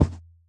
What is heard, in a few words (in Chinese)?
骆驼脚步声